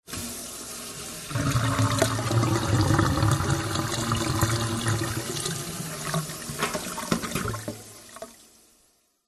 household kitchen sink metal tap run water drain gurgle